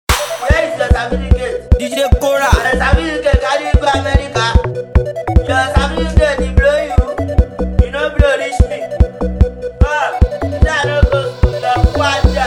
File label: CRUISE BEAT